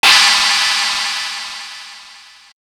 china cym; pitchshifter PSI

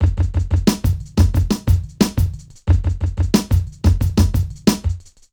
Urban Beat, Drums

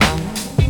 Old School Break, Drums

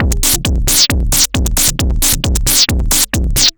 Dance Drumloop; Drums; Dance Beats

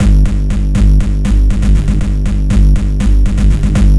Synth Bass; Basses

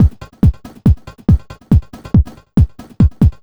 Dance Drumloop; Drums; Dance Beats

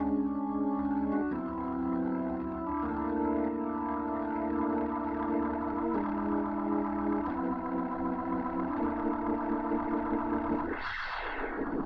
Organ

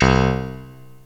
C1 ELG PNO; roland1

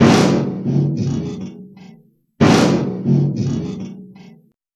Electronic Percussion

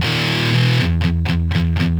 Electric Guitar, Loops; Guitars

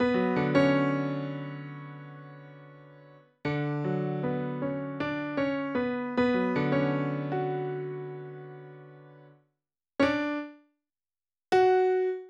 Acoustic Piano

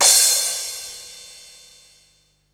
CRASH, DRUMS; SoundFactory; SMPMANIA

